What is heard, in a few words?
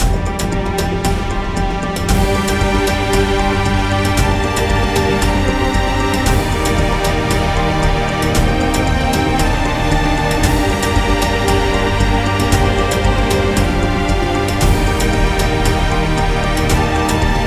cinematic; by burak sound bank; tension gerilim vol 50